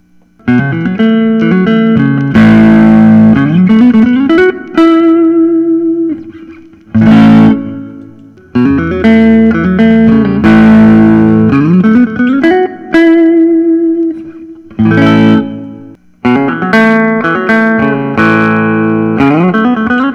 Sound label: collings i 30 lc blonde, guitar